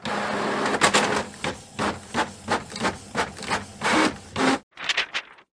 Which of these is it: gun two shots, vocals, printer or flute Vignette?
printer